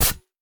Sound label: Dagger Hit Straight; We Are the Dwarves